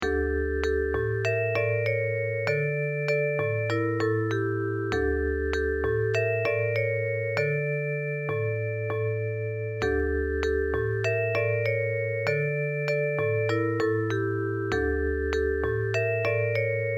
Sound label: short melodies exotic melody